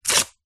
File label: Paper Rip